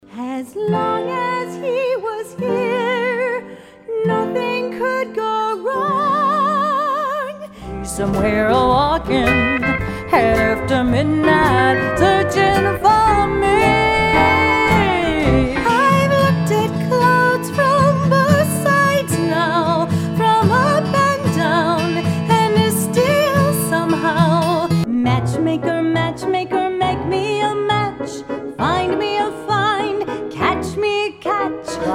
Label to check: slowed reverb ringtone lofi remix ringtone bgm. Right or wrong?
Wrong